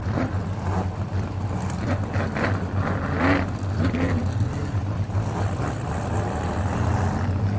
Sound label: race start idle revs; lightning speed